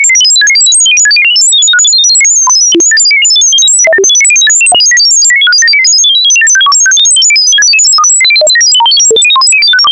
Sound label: Random Sine Bleeps 1960 S Sci Fi